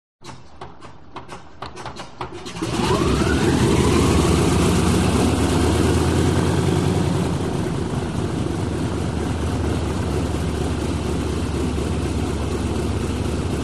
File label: Prop Airplane Start U; audio hero